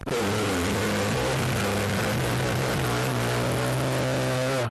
distorted scream long jumpscare layer creepy horror